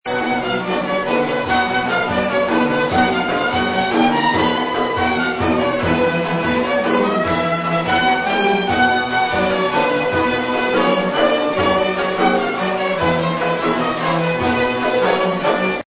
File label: oriental boogie